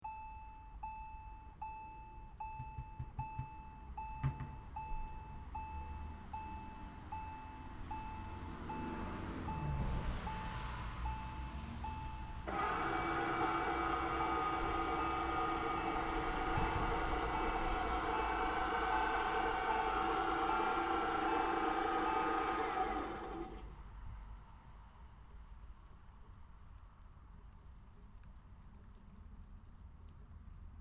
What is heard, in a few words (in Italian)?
Centovallina Passaggio a livello di Verscio; rumore lontano